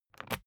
briefcase plastic latch lock key pull out; industrie wirtschaft; bueroarbeit

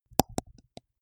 teeth clench multiple; koerpergeraeusche; menschen; soundpool

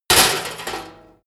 impact sheet metal bright; soundpool

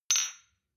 shot glass clink bounce medium; soundpool

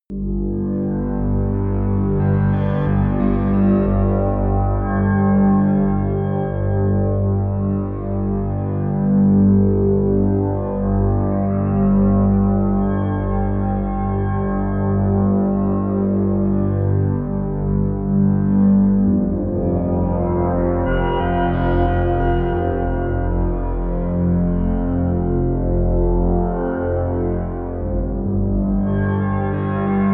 drone root melody

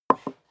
step high heels wood creak single stair down; schritte

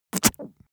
spit; soundpool

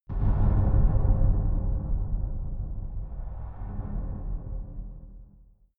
far dark metal rumble, hits impacts

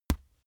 boxing gloves batter soft; sport freizeit; boxen

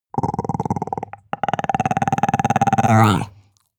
chihuahua growl stutter; natur-tiere; hunde

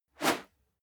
whoosh whistle

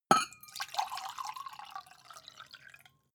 mug pour; soundpool

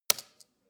keyboard hits hard, computer; kommunikation

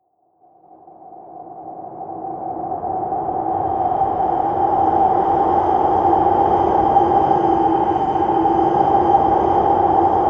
wind across plains; flaechen

